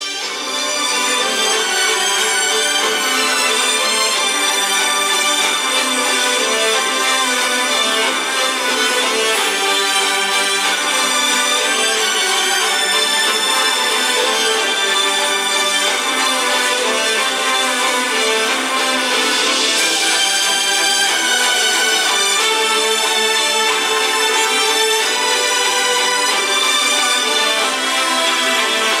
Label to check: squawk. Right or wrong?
Wrong